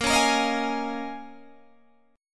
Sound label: Bb Minor 11th Bb down Guitar Open G